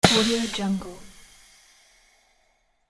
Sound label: industry clothes collar and cuff steamer; sound ideas